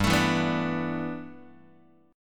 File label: G 6th Add 9th G, Guitar; G6add9 strummed